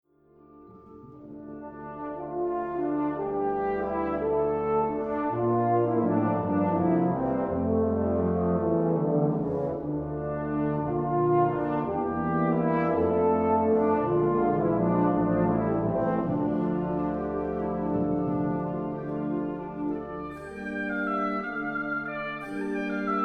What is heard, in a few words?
Concert Band Overture